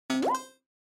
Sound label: Single Accent Mid